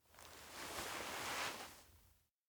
wake quiet; aino

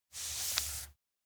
bookmark stroke; anemoi

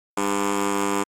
elec quiz wrong; anemoi

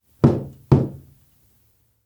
trailerhouse knock; anemoi

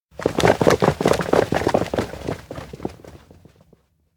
steps outdoor plaza run multi; anemoi

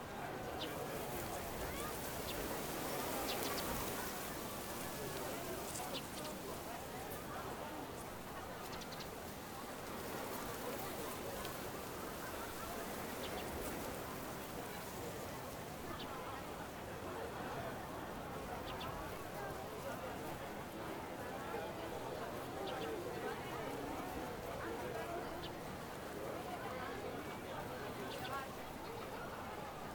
outdoor event plaza chatter busy; anemoi

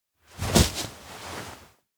sitdown ground; anemoi

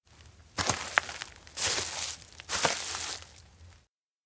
steps outdoor ranch enter; ken; anemoi

